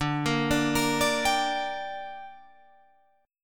D Suspended 4th Sharp 5th D down Guitar Drop D, chord